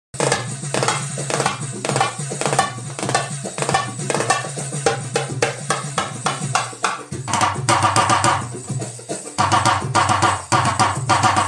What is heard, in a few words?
Kassoora, ساز